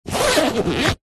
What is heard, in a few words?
foley luggage bag fabric small zipper short; zapsplat